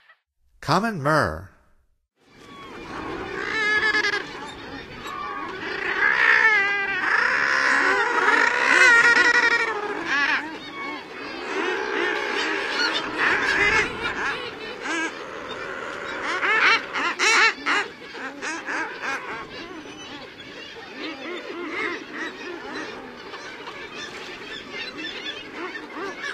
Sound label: Common Murre; North America; Stokes